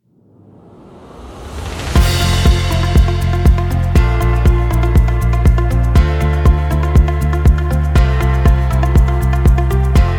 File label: Pop Pos